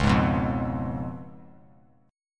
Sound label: Db 7th Db down; Db7 strummed; JGuitar